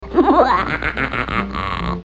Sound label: laugh; Loony Tunes; porky